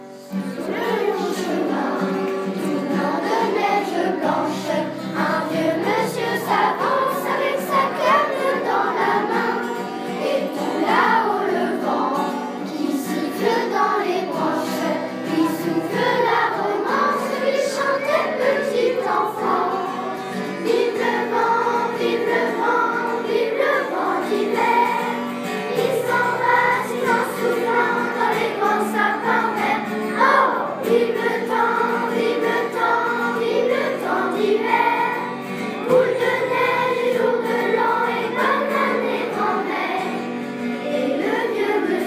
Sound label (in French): chant vive le vent